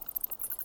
Mouse

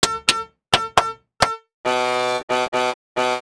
handclap

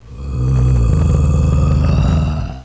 Giant

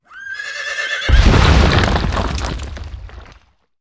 Dark Earth Quake

